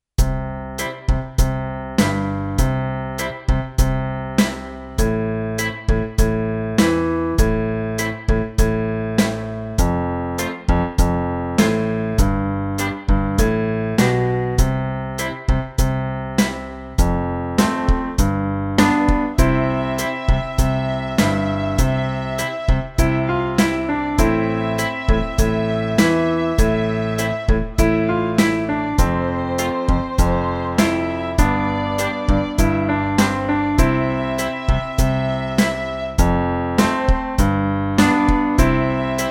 Doo Wop Ditty

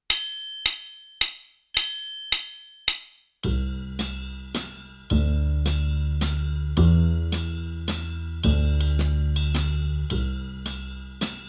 Midnight Waltz Track

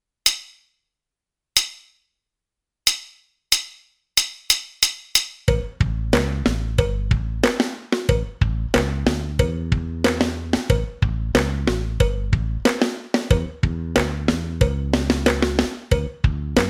Boss Beat Track

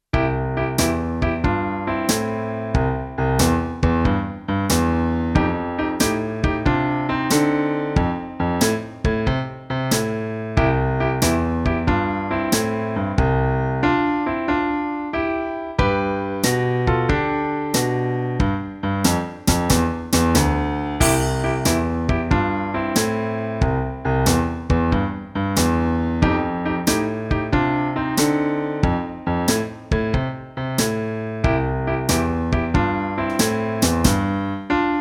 Mellow Blues

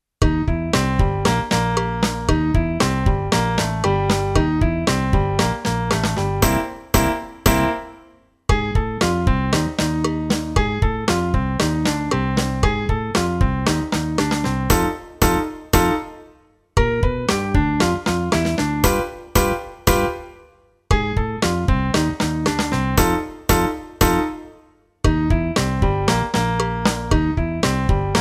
Foot Stomp Blues

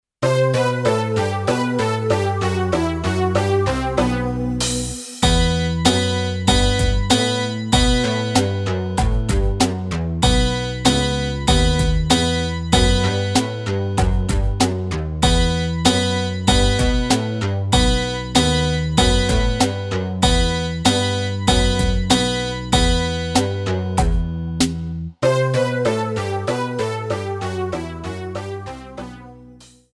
Guitar Lets Play B; Guitar Primer

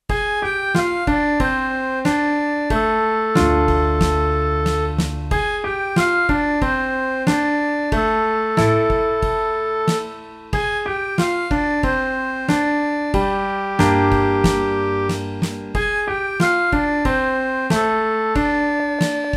Minor Mood

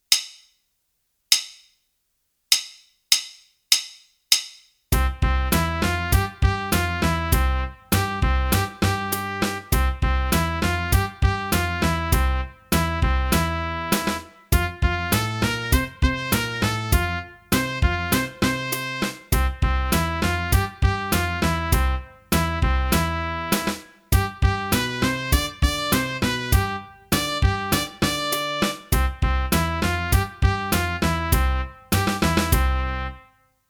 Baby Blues Track

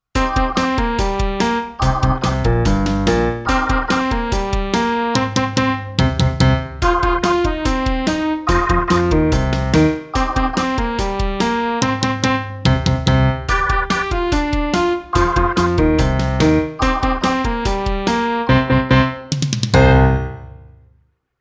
Echo Me Blues

